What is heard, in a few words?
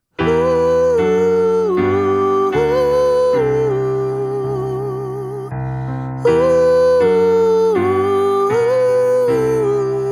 Sop w Lead, christmas choir